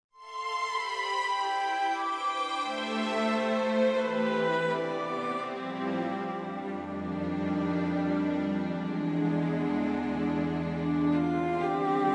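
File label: orchestra; ROLAND Library Preview CD SV-SP70-01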